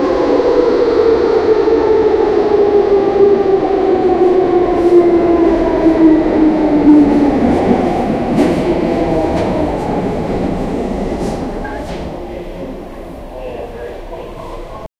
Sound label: subway station train sound; arrival